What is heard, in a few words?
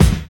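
bluster kick; Drums In Your FACE!; HIP-HOP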